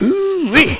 LAUGH; BattleSNDS

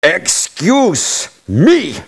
LAUGH; BattleSNDS

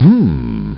humm; BattleSNDS

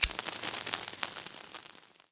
grenade fire

